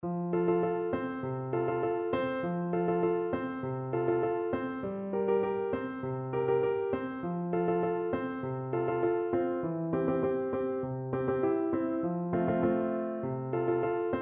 clarinet

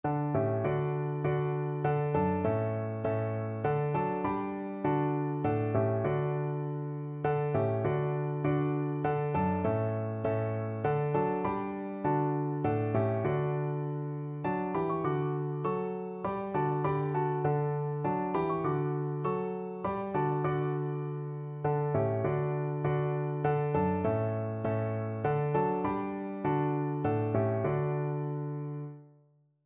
come thou fount, piano duet

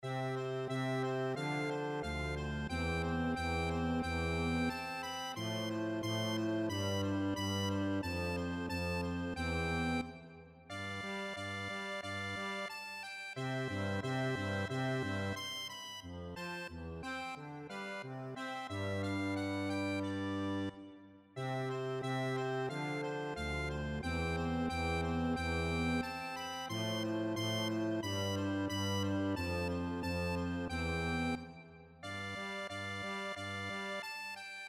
andantino, accordion; carulli

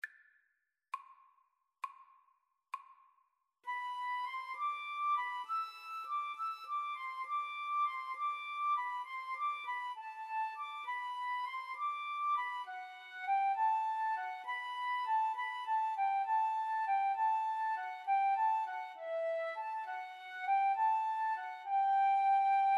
pastorale, Flute duet

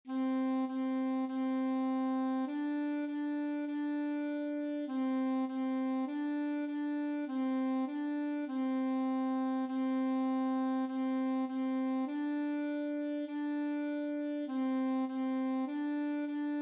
recorder

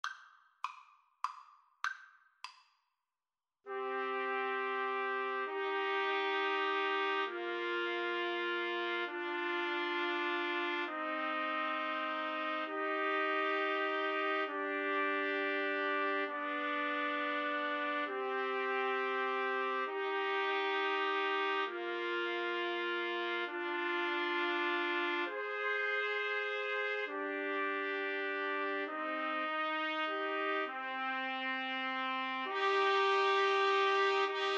greensleeves, Trumpet Trio

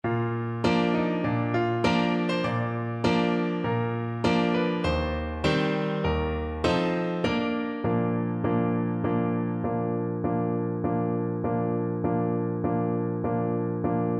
battle hymn BN, Bassoon

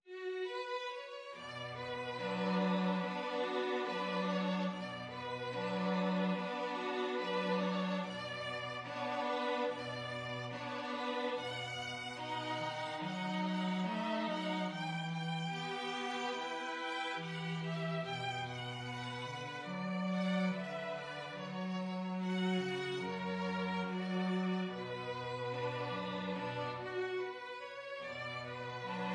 bella ciao, 2 violins cello

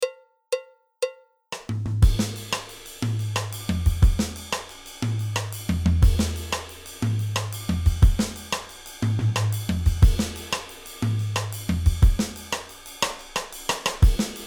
afro cuban feel, drums

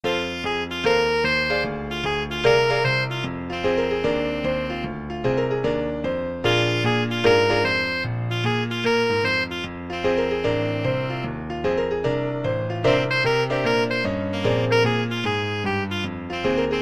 shuffle, saxophone

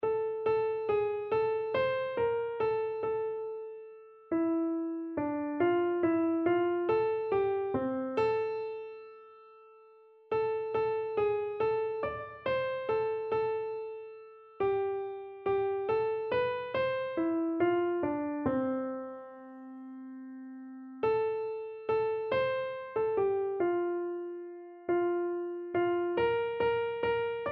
turn your eye, keyboard

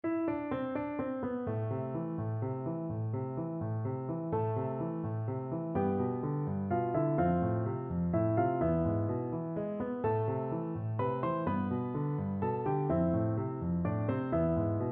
spanish violin PNO

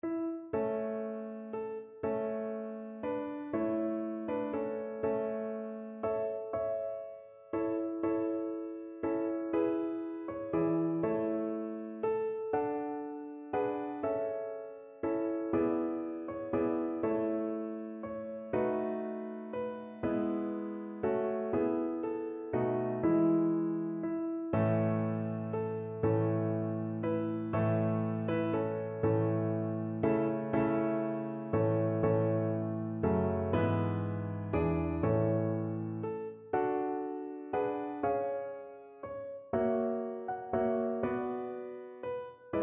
irish inspiration PNO, piano

